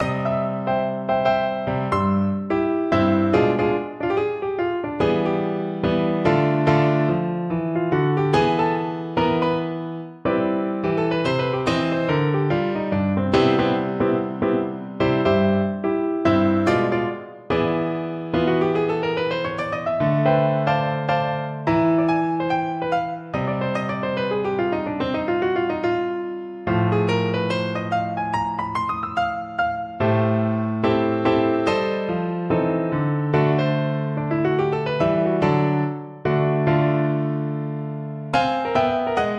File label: piano solo